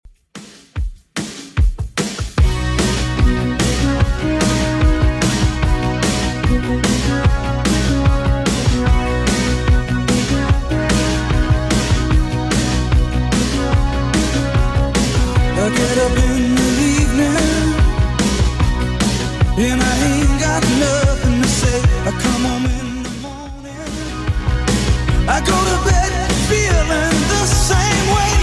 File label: 80s Redrum 148bpm